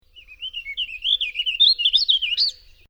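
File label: Vireo Warbler short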